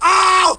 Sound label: pain; homer simpson